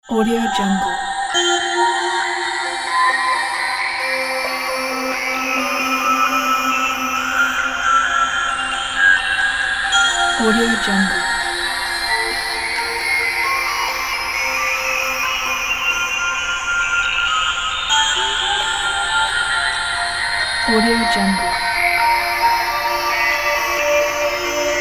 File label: dystopian scifi drone